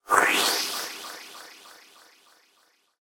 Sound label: Slurp